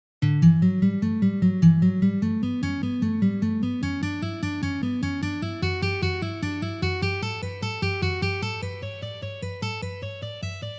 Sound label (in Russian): Uprazhnenie dlya igry gammy Si minor